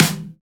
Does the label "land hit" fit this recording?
No